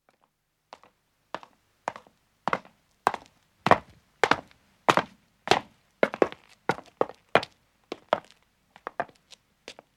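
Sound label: Footstep stone descending, 2 men approach and stop; BBC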